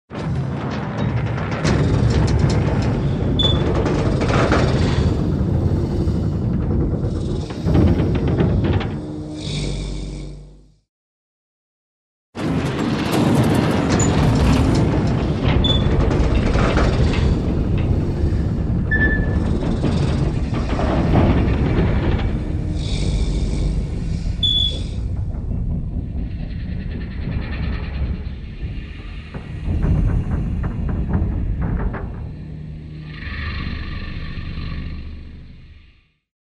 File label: Garage electric door opens, closes